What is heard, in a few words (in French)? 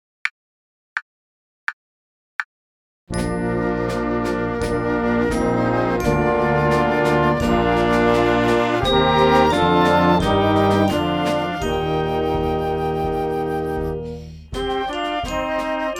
choral noire à 88